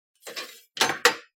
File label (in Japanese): 包丁をしまう音